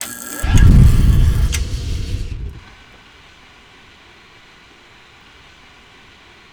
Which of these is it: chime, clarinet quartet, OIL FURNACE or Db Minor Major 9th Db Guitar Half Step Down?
OIL FURNACE